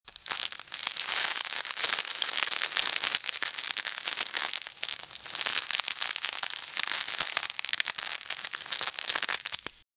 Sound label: friction of leather armor